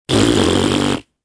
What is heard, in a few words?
razzberry, smeshnye mulitiashnye zvuki